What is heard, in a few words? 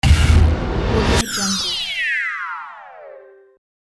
dubstep hit transition